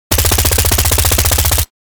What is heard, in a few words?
Sterling submachine gun short burst